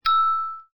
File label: ting